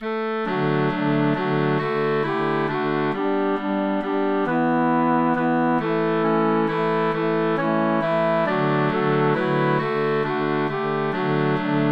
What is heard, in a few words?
Waltz Melody Harmony